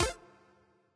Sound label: collect; bonus; aviamaster; Aviamasters